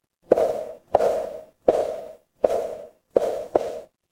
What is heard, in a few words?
footsteps heels